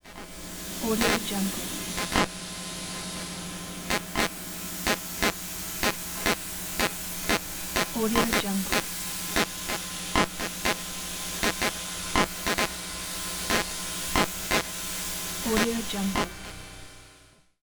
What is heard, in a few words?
designed glitch